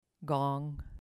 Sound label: gong